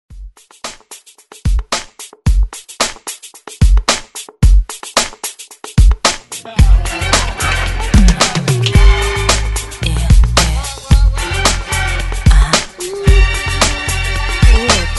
Re Drum 111 Bpm

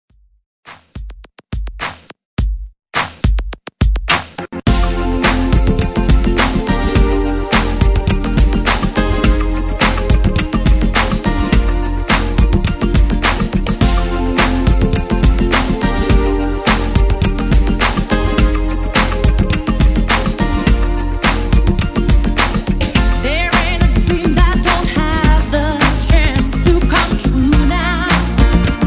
90s Pop Redrum

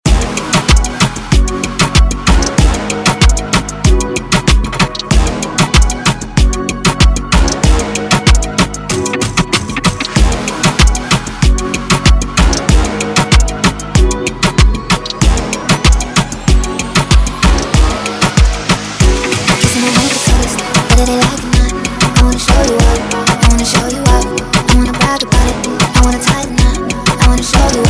Moombahton Redrum

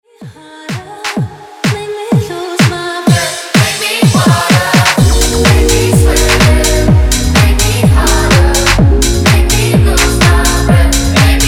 Tech House Clean

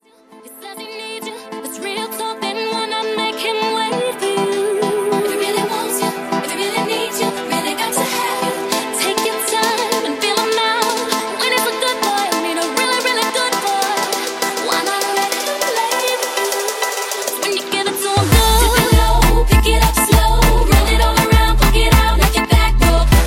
Moombahton Reboot; Remixfreakz

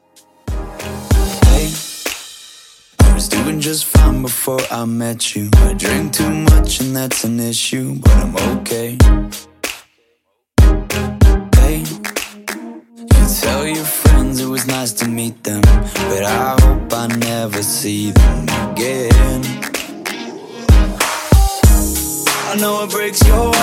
Transition 95 100Bpm

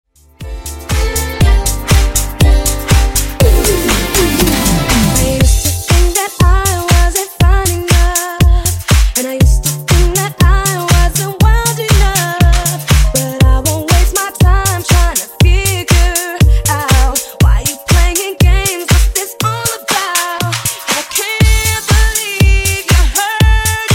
Funk Nudisco Remix 120 Bpm

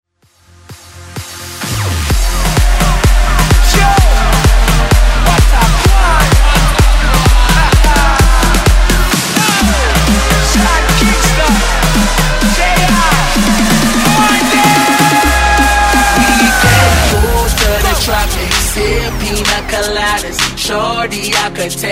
EDM Bounce Mashup

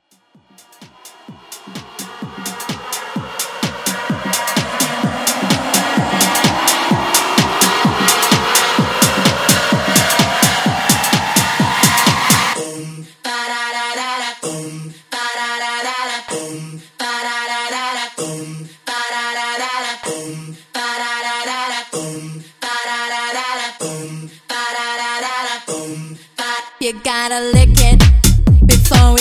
Vocal Edit

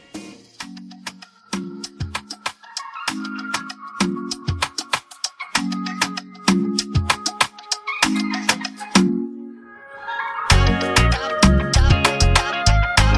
Latin Club 97 CLEAN

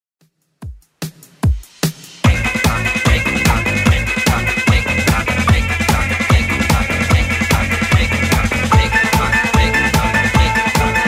70s Redrum 148bpm

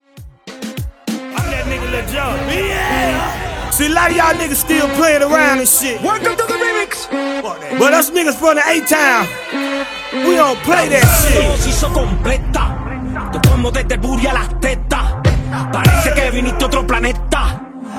Break Down Intro Reggaeton Dirty 100 BPM

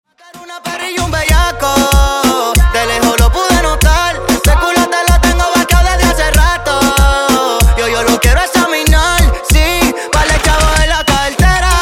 95Bpm; Dj Intro Outro Redrum Dirty

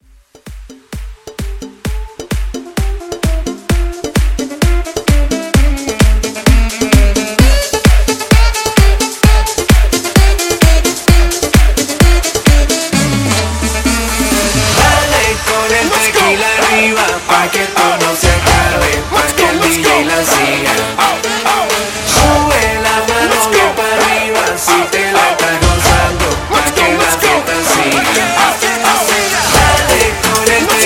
Latin, 130; Latin Hype Edit

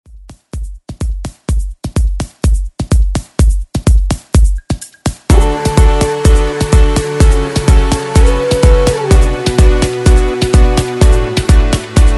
Re Drum 126 Bpm; DJDOLLS

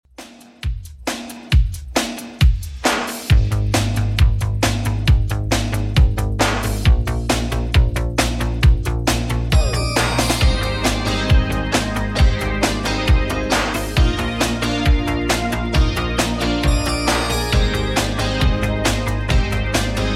80s Redrum 135bpm

